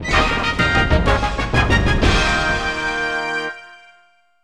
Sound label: Stage Clear; Beat Saber Clear Fanfare